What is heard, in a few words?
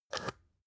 spice jar scratch close short